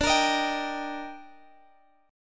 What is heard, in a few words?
D sharp Major D, chord; D#/D; strummed; chord shape